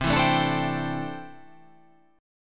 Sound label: C 6th Add 9th C down